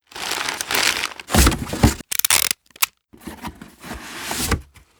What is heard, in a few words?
item gift wrap use